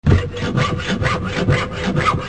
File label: Hacksaw; Tools